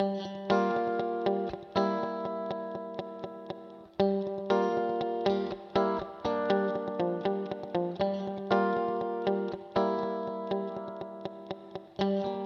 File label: Guitar Eventide H8000 Masterverb Hall 2; Vintage Digital